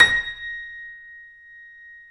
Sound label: KEY, YC7; Roland L-CD701